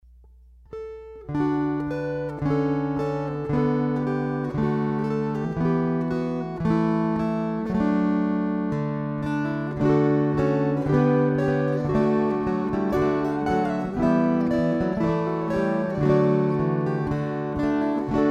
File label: String Choir